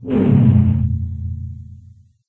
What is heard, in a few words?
hoverboat thrust boost; There; ThereClient